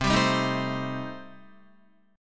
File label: C Minor 6th, C, down, Guitar; Cm6 strummed; JGuitar